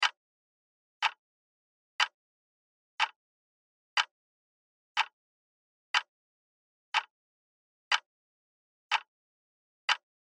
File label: Household Alarm Clock Tick Tock Loop; Alarms; Electronics; Blastwave